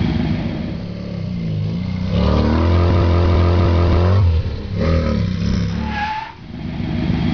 car drive, ambience; svencoop; azuresheep

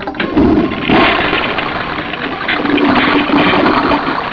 flush; ambience; svencoop; azuresheep

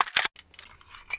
mag out, M41A; weapons; svencoop

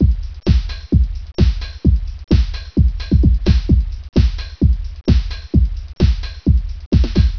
music; svencoop; azuresheep

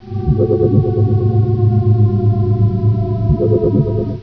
arcade, ambience; svencoop; azuresheep

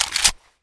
mag in, M41A; weapons; svencoop

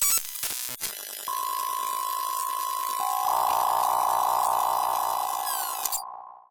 hacking; svencoop; twhltower

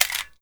clip out; svencoop; weapons

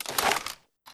pickup; usp; svencoop; weapons

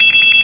mine activate; weapons; svencoop

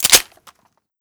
slide back; usp; svencoop; weapons

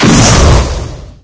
rpg fire; weapons; svencoop

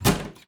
panel locked; svencoop; twhltower

